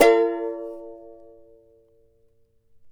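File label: charango B major down; Spectrasonics; Hans Zimmer Guitars VOL-2; CHARANGO CHD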